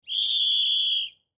Whistle Blow, Foley Whistle Plastic Blow Short Burst; Whistles; Objects; Blastwave